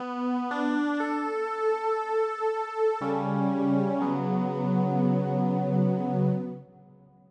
lose music

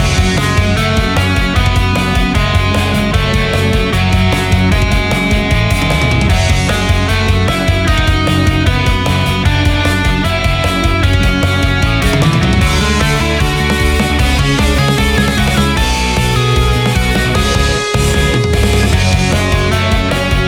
jrpg battle loop, JRPG Epic Rock Battle Theme

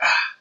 hurt

